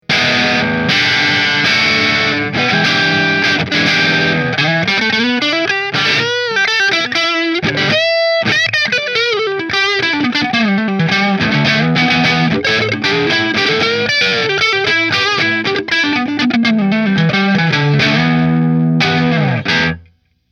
Melancon Neck Mini Position 2 Marshall

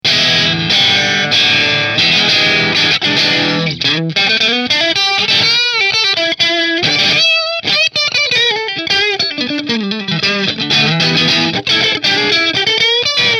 Tokai Strat Position 1 Marshall

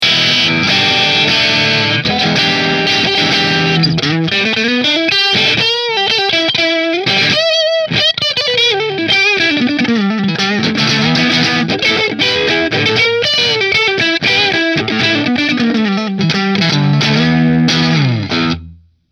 Nola Voodoo Natural Middle Split Marshall; New Orleans Guitars Voodoo Custom Natural Finish

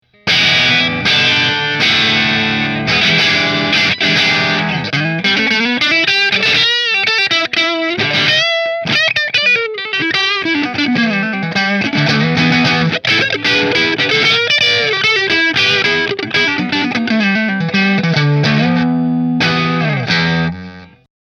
Godin Neck Marshall, guitars; Godin LGXT Trans Blue

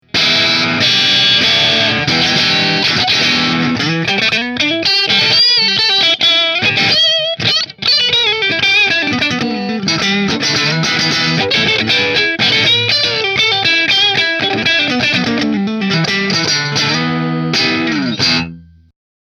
Green Middle Split Marshall; New Orleans Guitars Voodoo Custom Dark Green

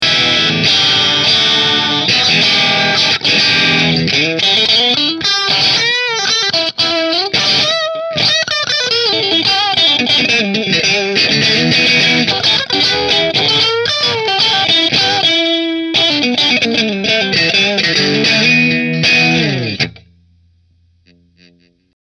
Grosh Strat Position 5 Marshall

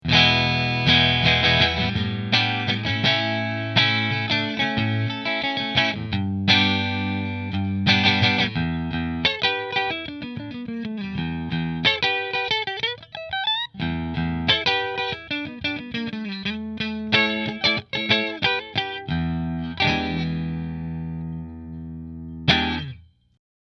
Rahan Sunburst Position 2 Fender, guitars

